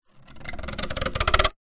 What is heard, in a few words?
office plastic squeak stretch adhesive tape